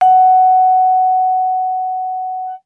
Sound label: Vibraphone short sustain ff Gb5 stereo; MIS Pitches - 2014; Percussion